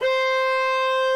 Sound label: Synth C6 melo